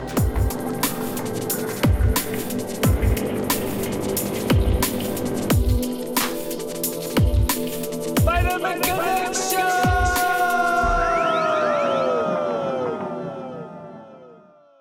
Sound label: gameplay interstitial